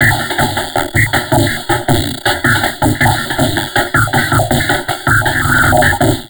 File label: 160, Drum Loops Crazy Processed